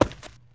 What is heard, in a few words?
tile, footsteps infected walk; player